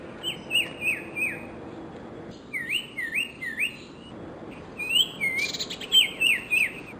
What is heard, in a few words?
other common calls; Amar